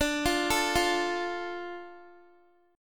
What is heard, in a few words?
D Minor D Guitar; Dm arpeggiated; x,17,15,14,17,x